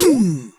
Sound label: Player Big Jump; HeavenStudioPlus; working Dough